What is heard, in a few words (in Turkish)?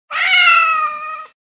Kedi Sesi Telefon Zil Sesi